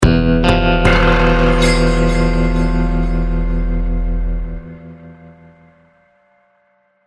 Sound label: deathcam